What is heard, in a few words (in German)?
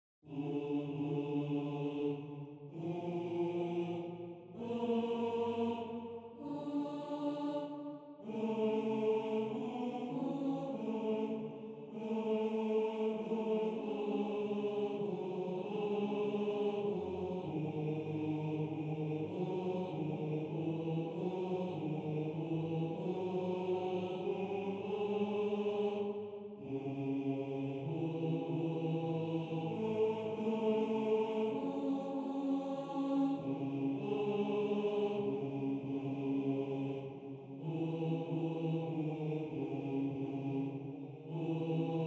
Tenor